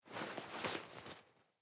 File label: Foley Actions Default Wolf Jog Start, Clothing; Player